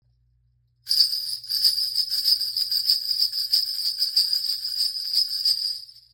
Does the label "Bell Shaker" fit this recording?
Yes